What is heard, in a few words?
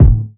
kick, Classic Hip Hop